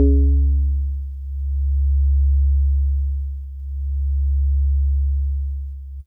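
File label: Jazzy Organ DX100 C#2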